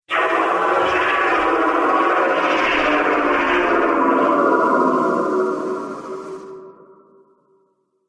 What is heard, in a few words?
Laser Mining Beam, sci-fi laser sound effect; Laser Future - Mining Beam